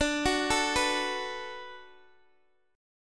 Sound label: D 6th D down Guitar Open D, chord